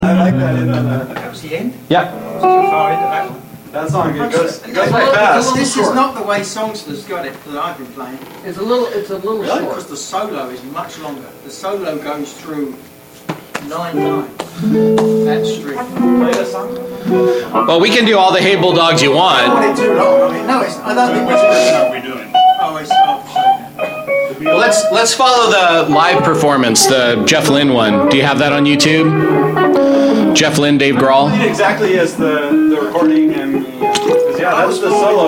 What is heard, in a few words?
Background Mic Mix, Bass solo